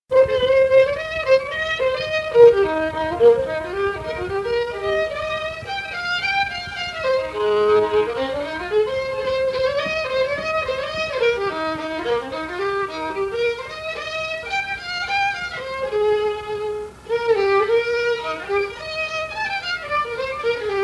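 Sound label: Clarinet Polka, field recording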